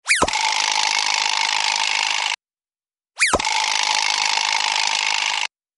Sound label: Vibra Slap Percussion Alert